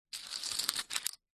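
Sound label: leisure poker chips handful pick up scoop from hard surface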